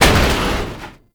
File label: Metal Crate Destroy; Gameplay; Warframe; Tau; Destructible Dynamic